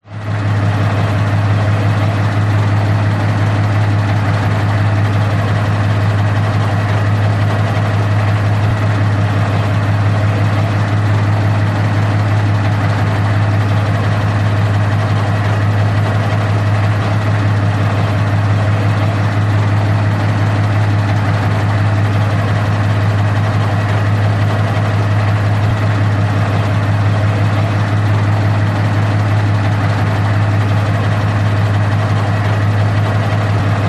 warfare tank matilda mrk 2 1939 engine idle internal pers